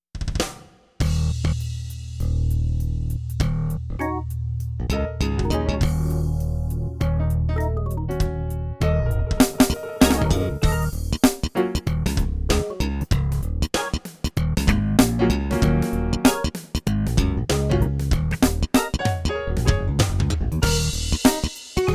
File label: light Jazz